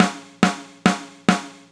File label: 140bpm intro